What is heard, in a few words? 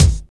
00s clean bass drum sound g sharp key, Royality free bass drum sample tuned to the G# note